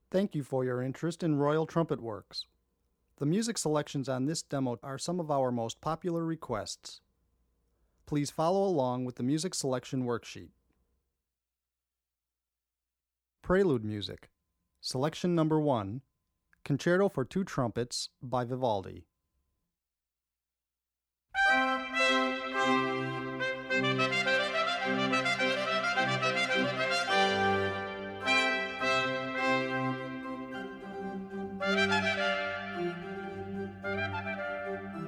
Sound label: Concerto for 2 Trumpets; Vivaldi; philipfeo